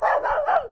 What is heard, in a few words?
dog; TamTamMicro.activity